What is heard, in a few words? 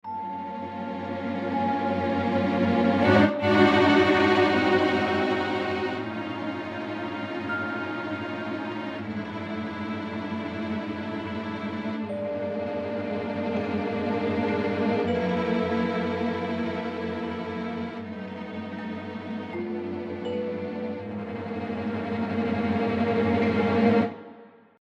Horror Chord Progression